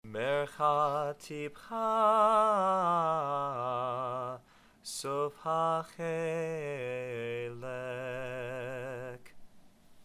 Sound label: Merchah Tipchah